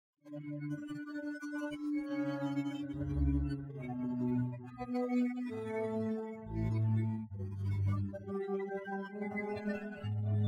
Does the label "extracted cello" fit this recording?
Yes